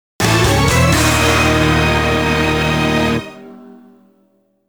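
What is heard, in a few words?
Jingle Type Unknown B; PS3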